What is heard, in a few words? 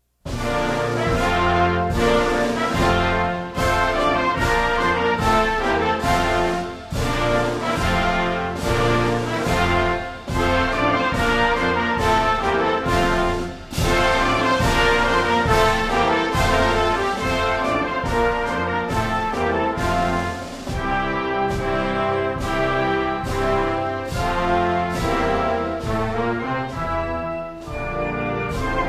anthem